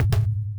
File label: hit, jingles; gbjam12